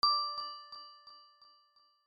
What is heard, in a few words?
Ding